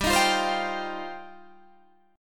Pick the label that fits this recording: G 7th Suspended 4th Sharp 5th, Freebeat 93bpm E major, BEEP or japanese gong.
G 7th Suspended 4th Sharp 5th